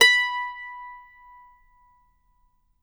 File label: MANDO NOTES, B5; Sampleheads; Whole Lotta Country 1 & 2